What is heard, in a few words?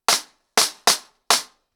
100BPM, ACOUSTIC DRUMS; The Roots Drumloops